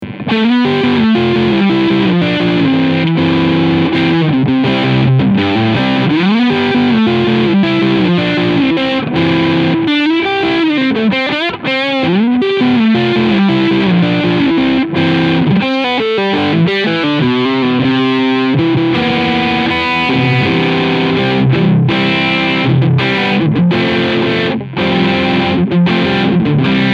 Fender Player Plus Tele Overdrive Sound beide PUs Parallel